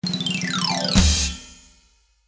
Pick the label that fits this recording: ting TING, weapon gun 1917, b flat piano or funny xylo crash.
funny xylo crash